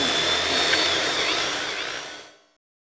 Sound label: attack; Necrometal; Mob